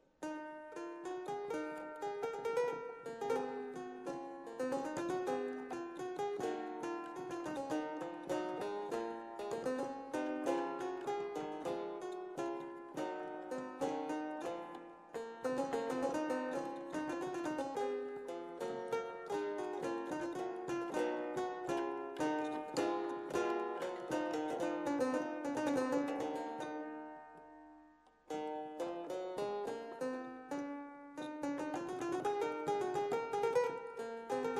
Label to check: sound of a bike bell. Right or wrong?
Wrong